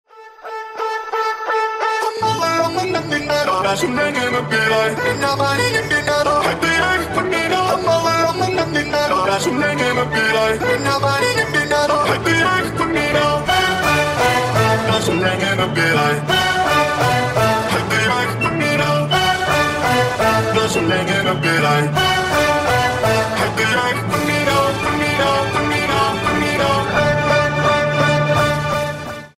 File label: remix